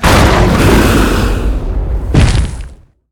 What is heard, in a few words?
crab deth